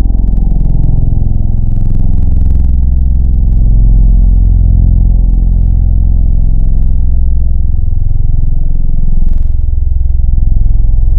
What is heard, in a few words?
Outta Phase, Synthwave; Synthetic's Serum Collection; BVKER - Synthwave